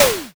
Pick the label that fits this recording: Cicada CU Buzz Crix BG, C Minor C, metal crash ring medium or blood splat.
blood splat